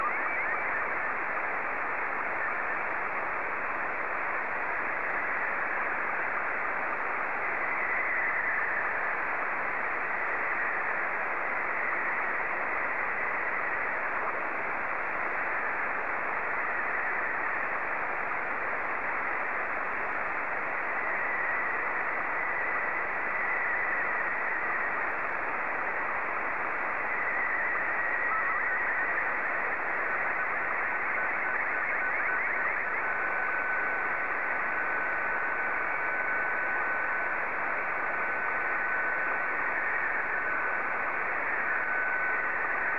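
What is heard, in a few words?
SSTV, Scottie 2; JA3OEN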